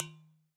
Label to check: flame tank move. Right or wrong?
Wrong